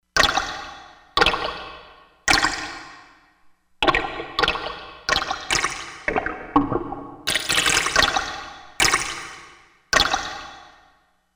Water Drop; Korg MSC-16S Environment

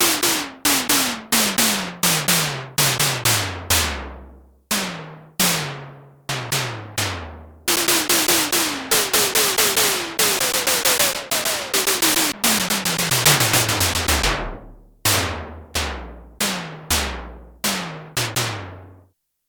SYNTH DRUM I, Korg DW-8000; Factory Patches

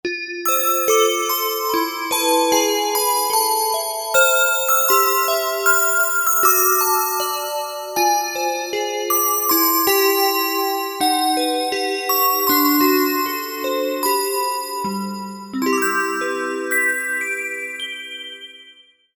Bell, synth

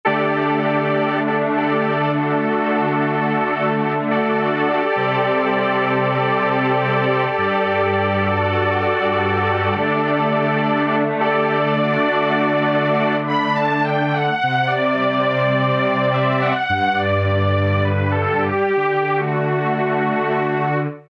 Lo-Fi Strings; Roland SC-880; Factory Patches

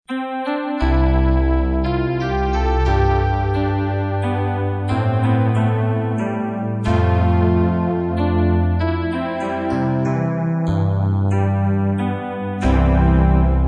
GX Dream, Yamaha TG55

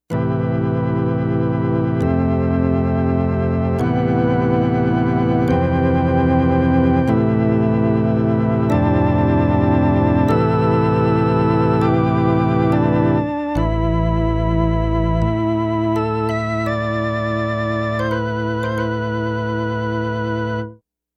Angel Rotor

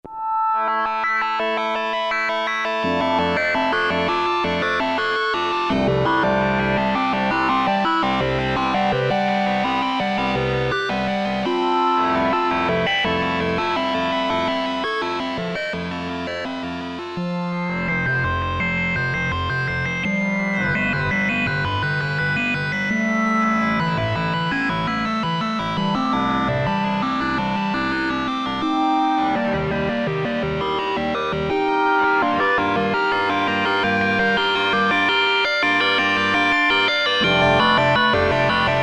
RHYTHM & SYNC, Roland Jupiter-8; Factory patches

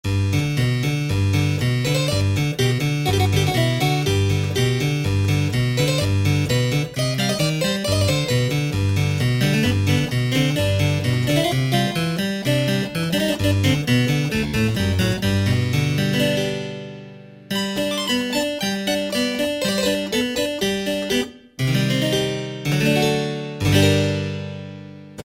Harpsi; Piano - Keyboards

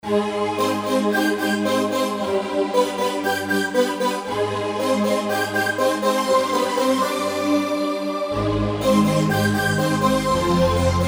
Arena Tron; Generalmusic Equinox

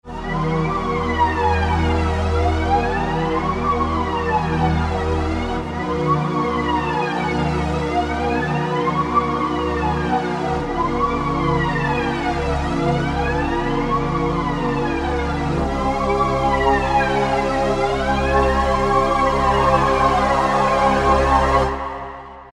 Sirena; Roland SR-JV80-11 Techno Collection

